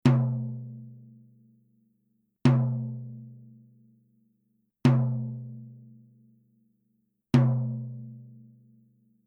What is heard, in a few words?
TOM EC2 Clear Soft; evans; naciag do tomow uderzany